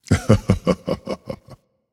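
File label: "heh heh", nonverbal; smite; khepri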